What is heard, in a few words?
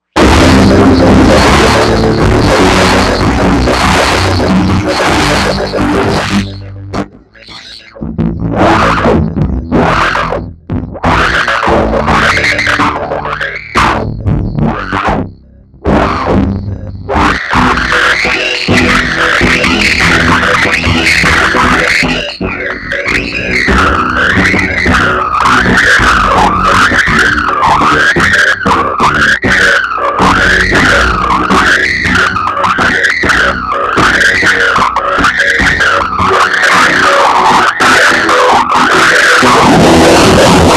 Guitar screamer; Plague Bearer